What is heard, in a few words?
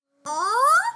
surprised sound